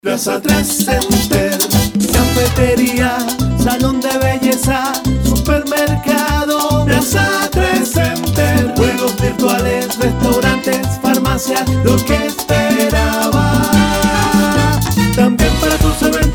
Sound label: jingle; plaza tres center